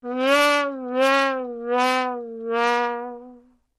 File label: Trombone Comic Wah Wah Wah